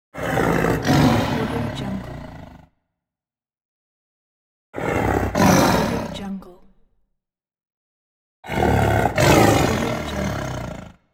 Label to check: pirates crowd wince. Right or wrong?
Wrong